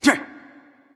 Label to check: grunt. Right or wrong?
Right